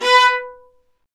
String Sections, Violas Marcato

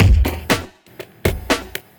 housefunk, beats; Sonic Foundry (Sony Creative Software); Ugly Remnants VOL-2

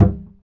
acoustic bass fx; Spectrasonics; Bass Legends

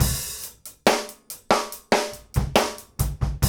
GROOVE, 60-85bpm; E-MU Producer Series Vol. 8 – Platinum Phatt

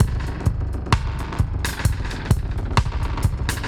Drums A Kit Echo 130, dub drums; musicradar

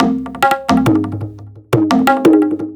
Tabla Effekts, percussion loops; KJ Sawka; Drum n Bass & Beyond; Drum Tool Box

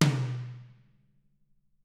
Toms; Bob Clearmountain Drums I

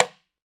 ITOTELE CHA, World Percussion

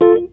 Rhodes Hit; Mo' Phatt; Digital Sound Factory Mo' Phatt

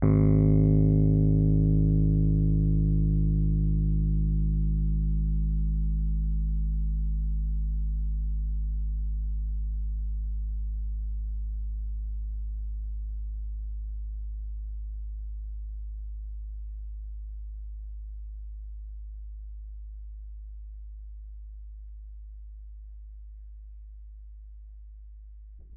G#1, Rhodes Mark II; mkII 88 key MID; CEPCo Sample Series Vol. 3

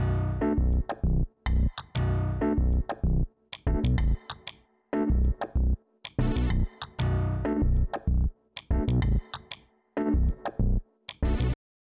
dat piano; Polyend; Loops n trouble

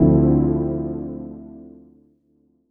D&B Pad; neuro

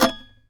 SAZ TAP; Spectrasonics; Hans Zimmer Guitars VOL-2

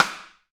SD RI, SD Rim; Sound & Vision - Gigapack I

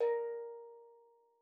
STEELDRUM A#3; Xsample VOL-4

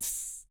cymbal, Roland MC-909

